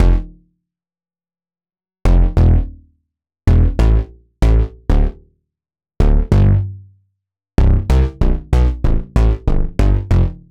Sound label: Poly Bass